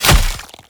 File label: Great Sword Impact Flesh; Weapon Impacts; Player; Swords; Combat; Founders Great Sword